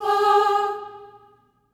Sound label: staccato M G#4, Voices; Spectrasonics; Symphony of Voices